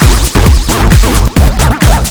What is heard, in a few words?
chorus noise 2ch 44kHz